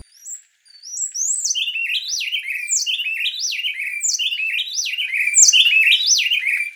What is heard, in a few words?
plain wren audio